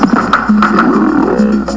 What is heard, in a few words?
Dance Music; Grizzly 1.3.1